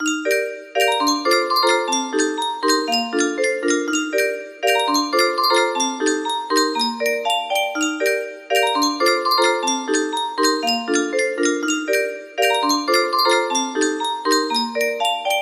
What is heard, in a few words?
60 note music box; undertale